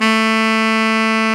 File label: SAX TENOR, Super Sax Tenor mf; Roland LCDP07; Tenor mf&ff